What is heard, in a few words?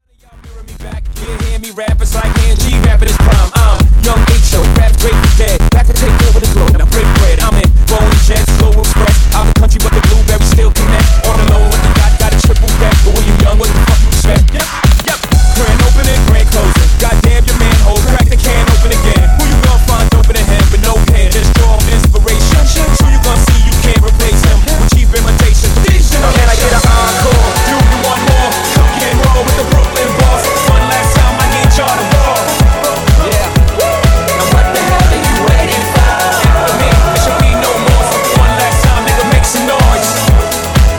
Bootleg Mixshow Edit 125Bpm; djyan